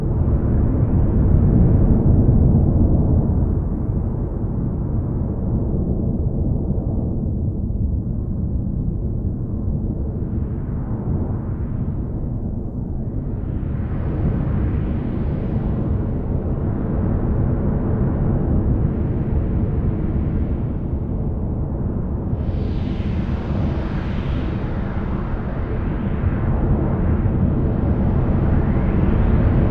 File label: Looping